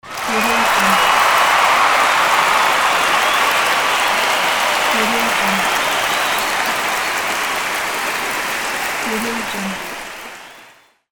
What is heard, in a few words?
crowd cheer